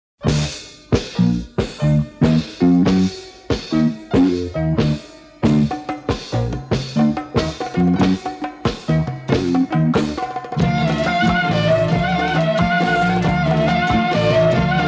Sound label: Instrumental